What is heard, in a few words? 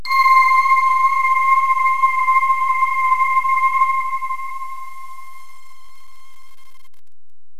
voice bells c4; Terra Sound Library; construction set